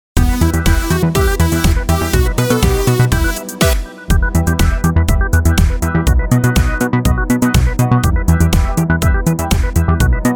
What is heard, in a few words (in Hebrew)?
דוגמית גינגל